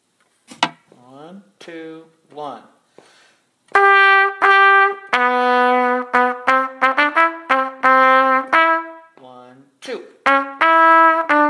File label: Marche Militaire